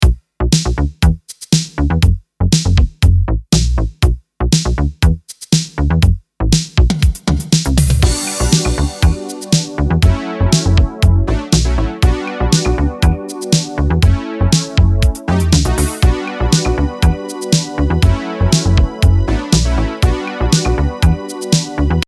funk synth

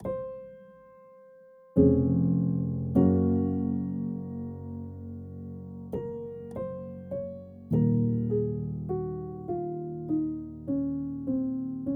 piano before

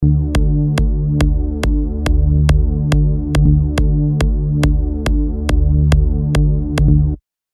multilfo tone dry kick

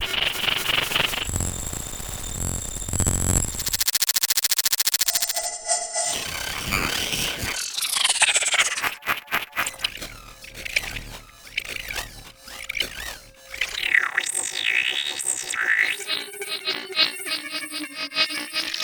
glitches

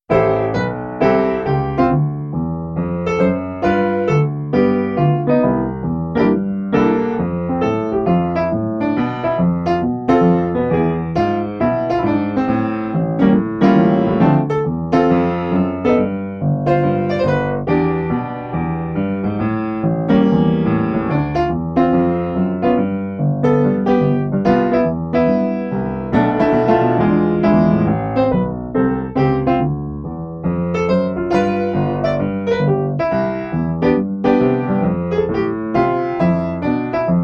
jazz impr

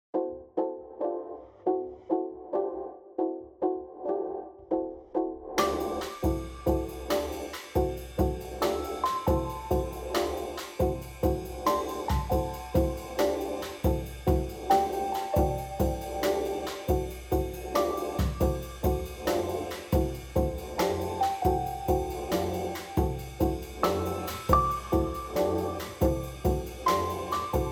jazzed thumps

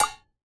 cowbell

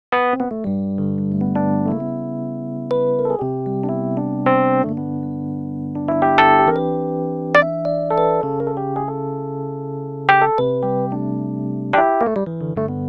dark v bright solo

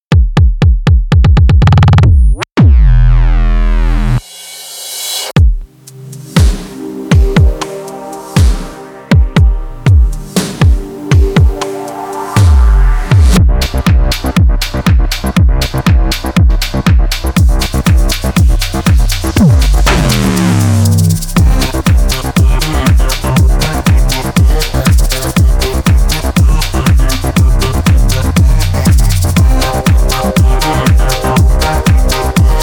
synth; 2getheraudio k1ck

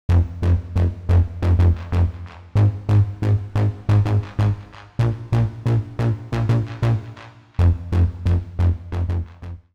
step modulator seq

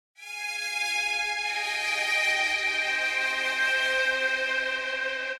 oblivion loop pad

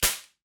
shooter gun shot fire

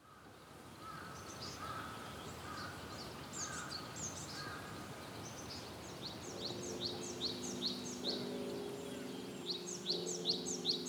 ambiences

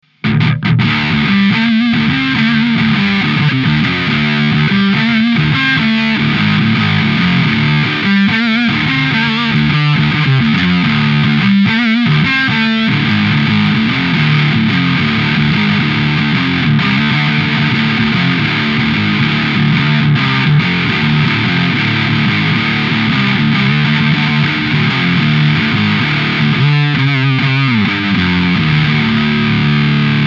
dirty low

